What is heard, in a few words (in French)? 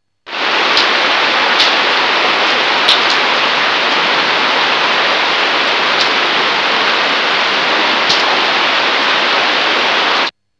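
pluie, bruits de la nature; sons nature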